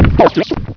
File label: step, gonarch; svencoop; cracklife